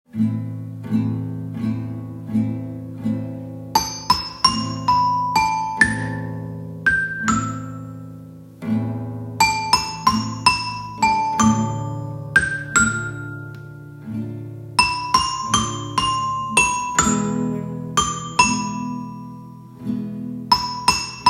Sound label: metallofon